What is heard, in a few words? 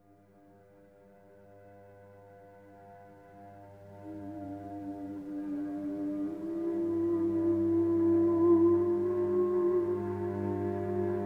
cello voice